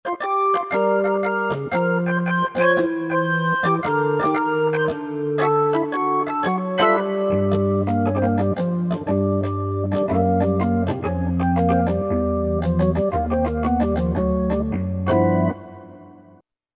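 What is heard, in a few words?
Click Organ, Korg MSC-8S Percussion; ClikOrgan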